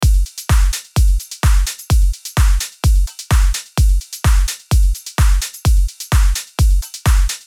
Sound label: Big Room Progressive House 128BPM